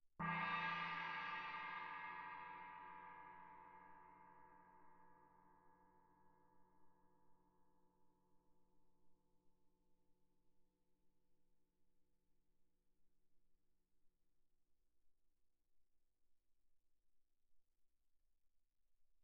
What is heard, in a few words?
chinese mallet mf, suspended cymbals; Percussion